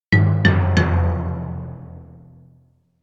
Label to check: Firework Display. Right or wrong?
Wrong